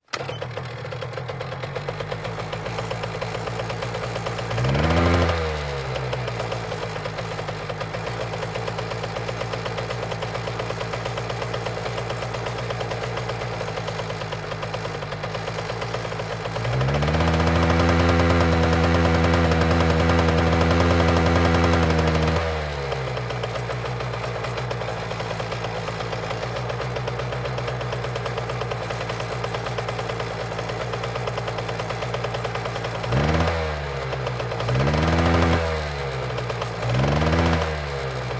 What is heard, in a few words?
Motorcycle starts, regassing, jamming